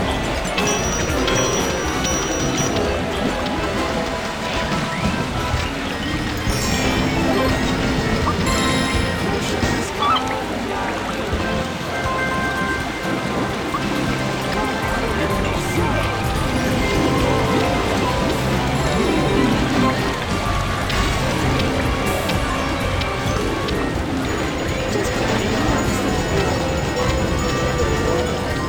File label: Wolf Loop; Real Deal Slots; Lobster Larry; SlotsOL